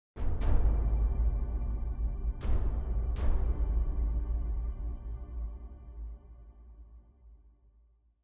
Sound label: Big Drums; Citdel